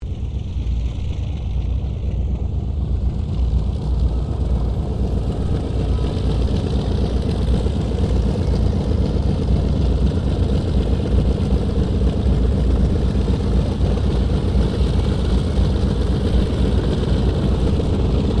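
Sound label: AIRCRAFT LOCKHEED L-1049 SUPER CONSTELLATION TAXI; 1955; Connie, Super Constellation; Airplanes (Sound Effects:Vehicles)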